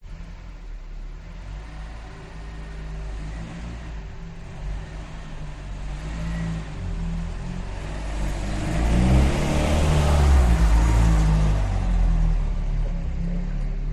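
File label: exterior leaving garage; detunized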